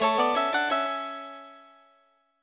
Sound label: Coffee Fanfare